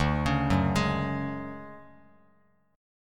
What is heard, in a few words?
D-Major Sharp 11th, D, Bass Standard; arpeggiated; JGuitar